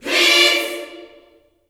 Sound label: PEACE CHD, Vocal Gospel; Spectrasonics; Vocal Planet Vol.1 Gospel; akai